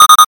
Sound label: alarm